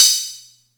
Atlantex Music Percussion Computer Cymbal Short, drum machine